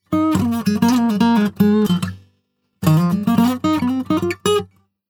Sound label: Delay Before